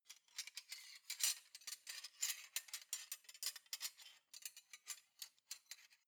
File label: cutlery large multiple rattle long